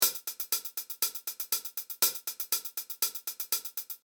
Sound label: HH velo